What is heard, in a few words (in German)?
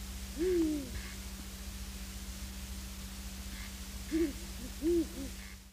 habichtskauz